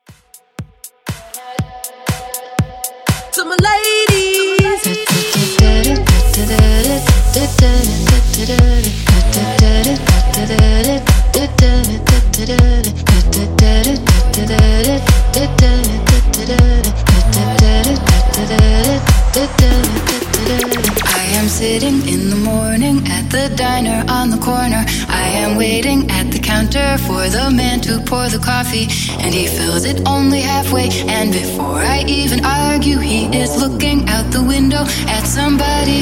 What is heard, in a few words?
House Mixshow Blend 120; Frey